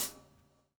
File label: SLIK